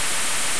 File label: fuse; Giza